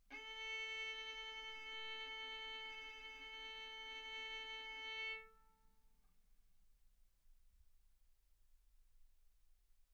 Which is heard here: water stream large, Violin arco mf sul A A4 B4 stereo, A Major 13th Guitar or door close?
Violin arco mf sul A A4 B4 stereo